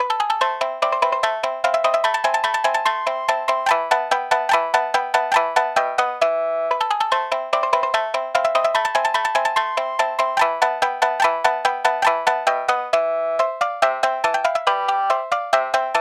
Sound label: shamisen